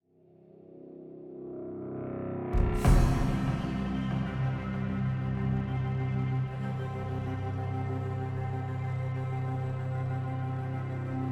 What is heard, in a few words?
music draft pickintent; champ select